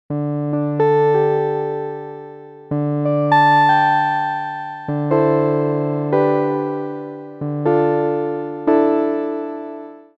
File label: Keysuite RMI dry; DRP-20